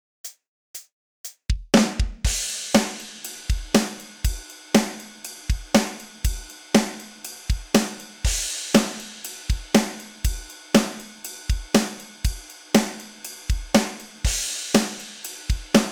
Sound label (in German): drums ohne